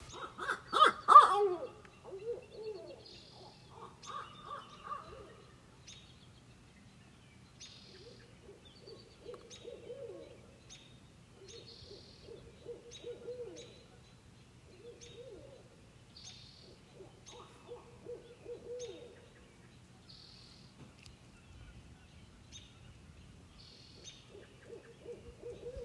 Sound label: Owl morning